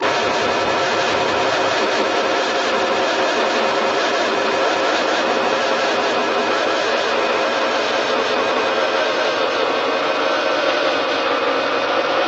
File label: warble